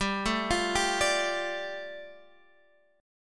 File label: G 7th Suspended 2nd